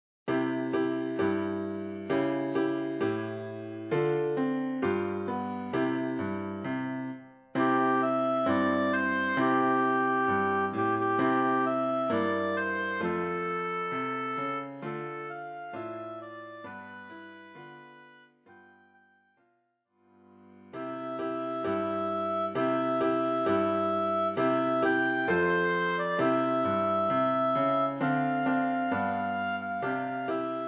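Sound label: oboe; Jingle Bells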